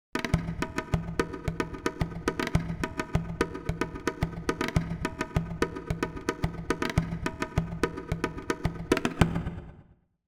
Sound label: Drums Asian Dance Beat - Longer; Hollywood Edge; sound designer's toolkit v2